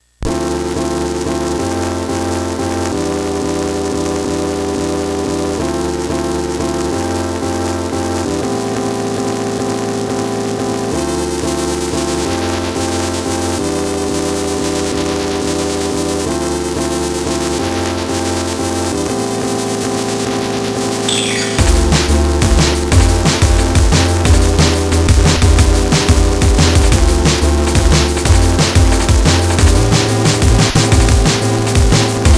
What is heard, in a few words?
piano; weeklybeats-2020